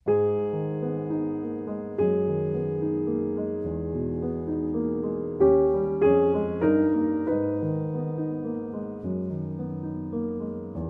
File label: Solo Piano